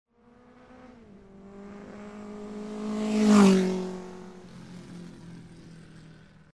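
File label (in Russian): motoc mimo, motorcycle passing by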